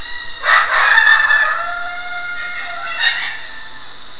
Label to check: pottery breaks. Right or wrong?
Wrong